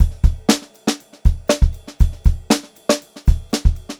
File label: FUNKY, Funk Groove Drumloops; USB Soundscan vol.38; AKAI; 90 s Sample CDs